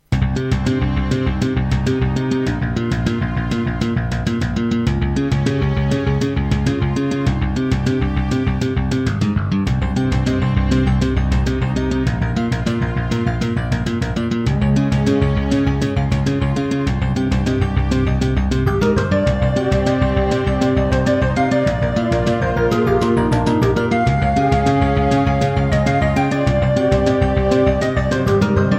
Introduction, EMU SoundEngine; Hocus Pocus

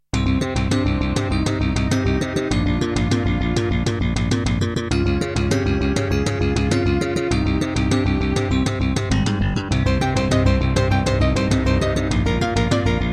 Introduction, Roland SC-7; Hocus Pocus

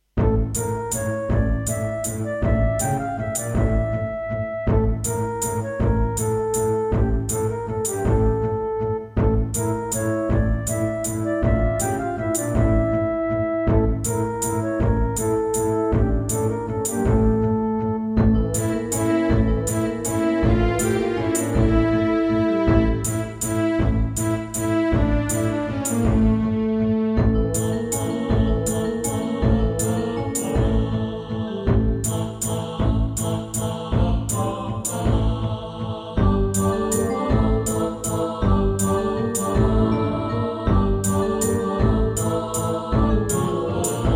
Yamaha MU2000EX XG MODE

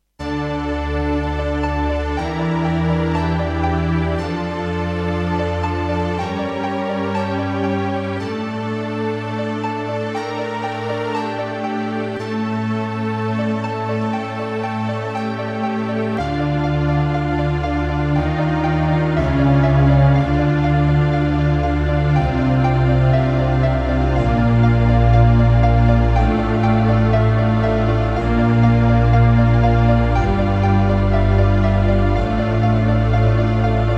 Terratec WaveSystem SCW001-2; Blood

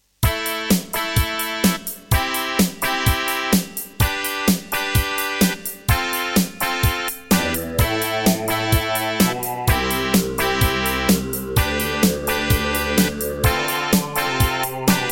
Raptor Wave 1 MidiPlus MiniEngine USB; raptor call of the shadows

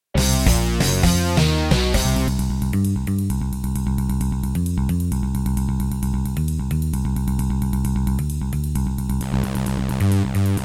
Yamaha QY70; sigil doom episode 5

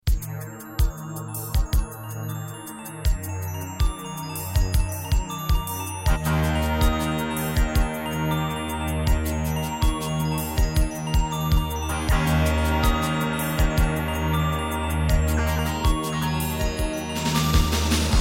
Track 4 Terratec MiniWaveSystem WT64; Battle Isle II

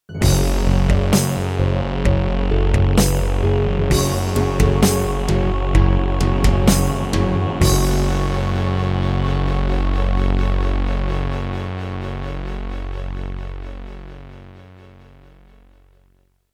Yamaha QY70; Doom (Sigil); Introduction

